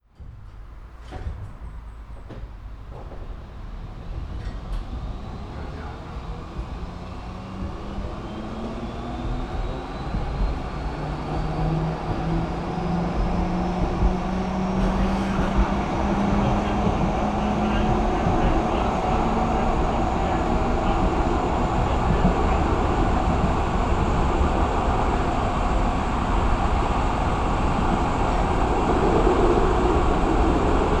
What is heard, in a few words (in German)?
L Wagen Sound